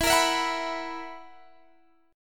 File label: B Major 7th Suspended 4th, guitar chord